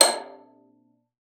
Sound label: VIOLA FINGER; Xsample VOL-5